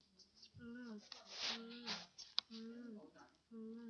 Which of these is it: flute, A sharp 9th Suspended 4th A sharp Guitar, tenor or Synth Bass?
flute